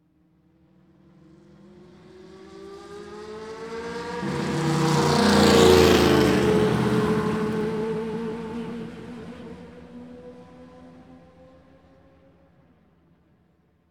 Crowds Race cars Baseball

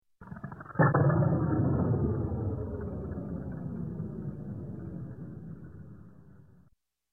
Space Space Explosions, Slow Single Blast; Electronic; Sound Ideas 2000 Series

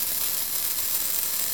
Fuse Burn Loop; Destruction

